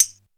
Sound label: shaker hi hat G key, Loudest frequency: 6653Hz; percussion